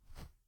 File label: Grassy Footstep